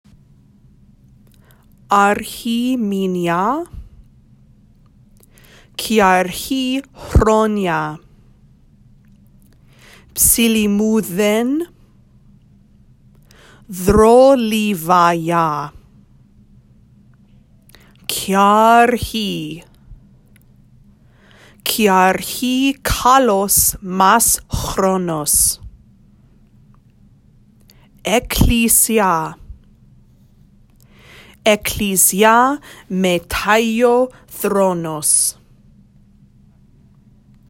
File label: Kalanta CALL & RESPONSE